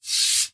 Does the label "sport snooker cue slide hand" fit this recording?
Yes